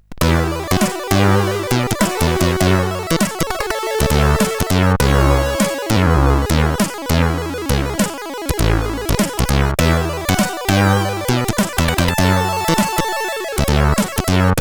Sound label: Jazzloor sid CSG8580R5